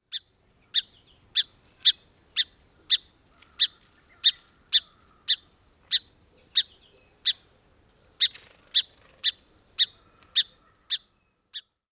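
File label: Song Sparrow chimp calls; NY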